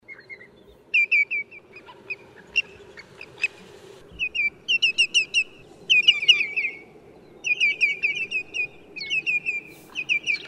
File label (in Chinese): 青足鷸; 青腳鷸; 鷸科/冬候鳥